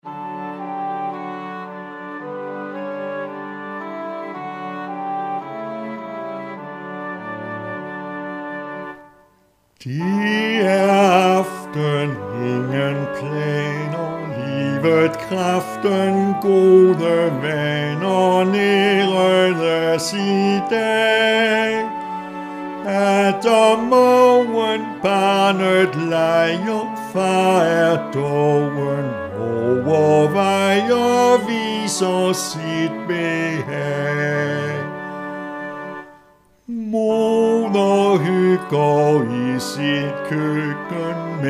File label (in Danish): Med vokal D dur; Det er aften.